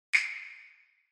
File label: Castanets